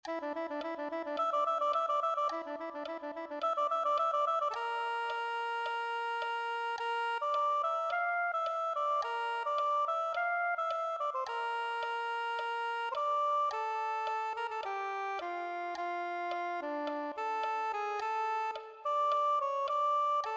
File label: soprano saxophone